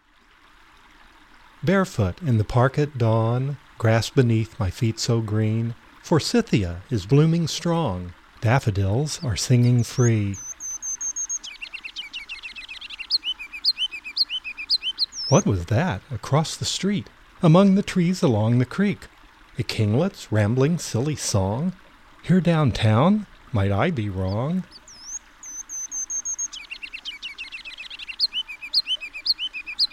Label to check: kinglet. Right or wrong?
Right